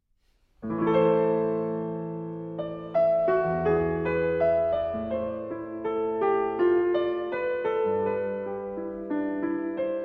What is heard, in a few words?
Keyboard Works, Toccata and Fugue in A Minor Grave